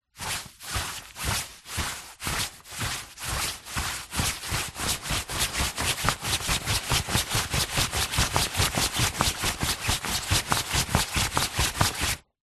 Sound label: foley coat winter thick walking into running movements arms